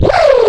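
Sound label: Robot Orbot Flinch; D3DEMO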